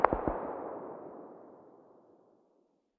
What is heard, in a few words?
hmg very far distance, 12.7x99mm; tacz-tweak-pack; taczmechanics